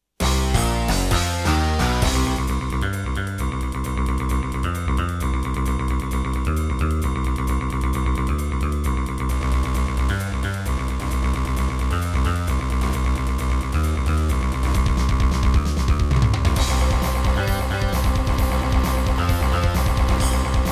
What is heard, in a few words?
Serdaco Dreamblaster X2GS BURAN V1.1